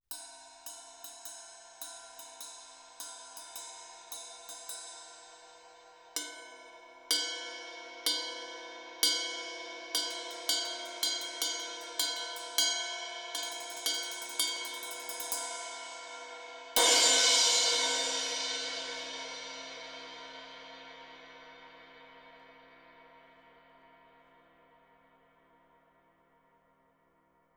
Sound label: Cymb Ride; 120 Degrees From Vertical